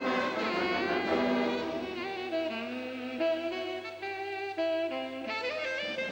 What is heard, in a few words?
solo